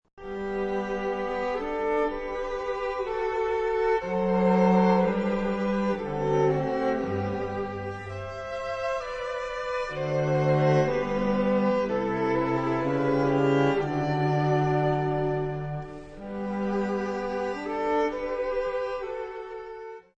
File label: Emperors Hymn